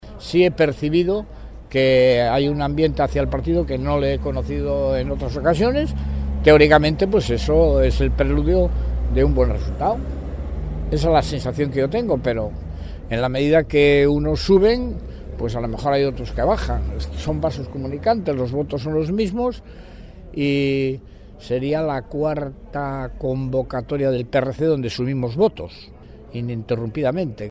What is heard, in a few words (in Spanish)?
ambiente